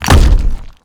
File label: asphalt block fall